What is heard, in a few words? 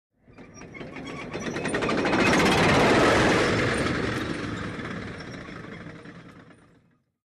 Conveyor Transportation